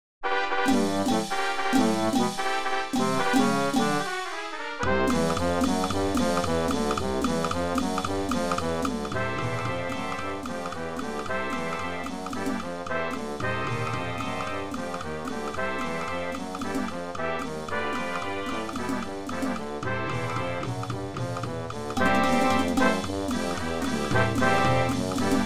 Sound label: Jazzy stomp; midi